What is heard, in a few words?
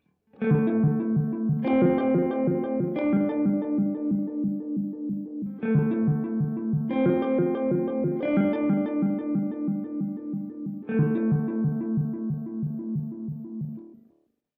Beetronics Seabee; Factory Preset